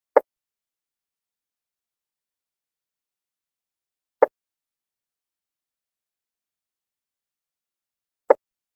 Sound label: Mistery frog